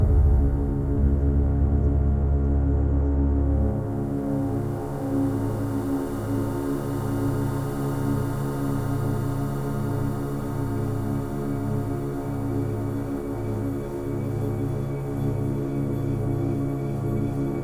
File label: The Boat Ambience; mirror's edge gamerip